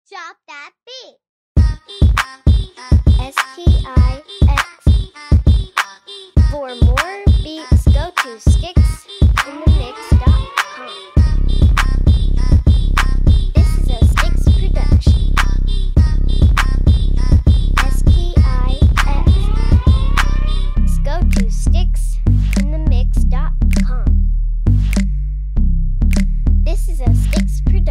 Drop That Beat

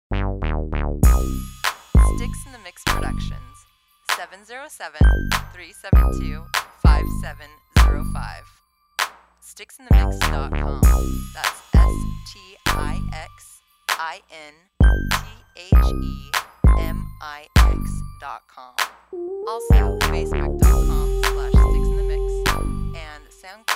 Chimes

